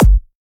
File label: Dr Kick